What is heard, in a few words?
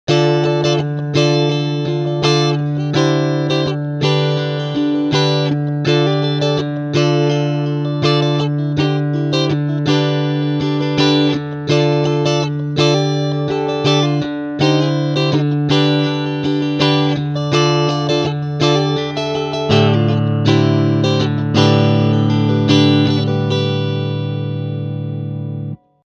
Rear Single coil strummed